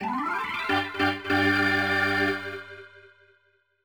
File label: Organ; World Series Baseball 2K1; Dreamcast